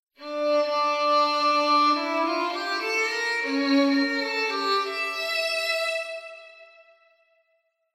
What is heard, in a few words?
short violin